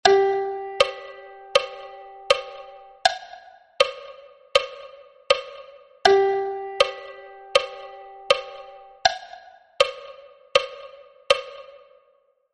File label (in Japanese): 全休符